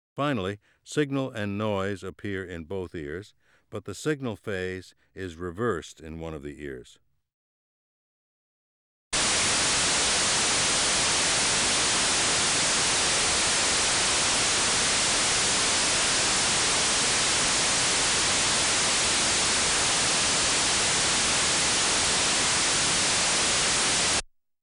Masking level differences, Auditory Demonstrations